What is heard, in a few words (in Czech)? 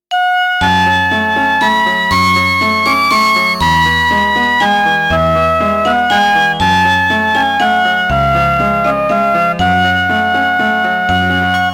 melodie